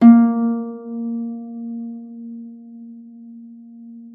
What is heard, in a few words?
harp forte; Xsample VOL-8